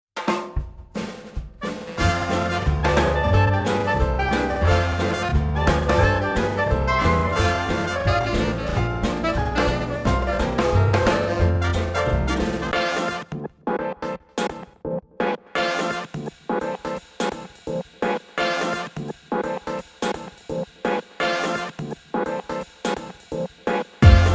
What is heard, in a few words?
Flip Flap Dance Machine